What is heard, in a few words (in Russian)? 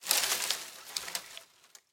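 тихое шуршание фантиков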